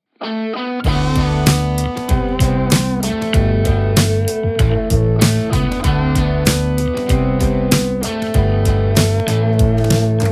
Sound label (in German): niemand instru